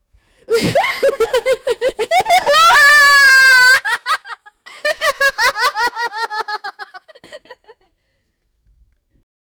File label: teenage child laughing